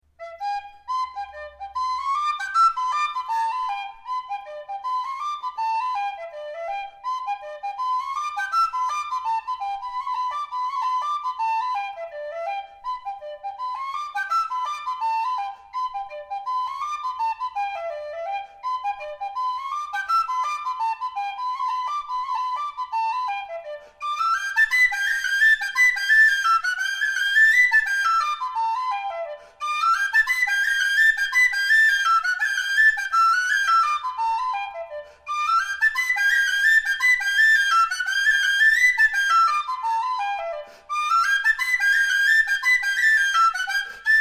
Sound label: Duke of Leinster, whistle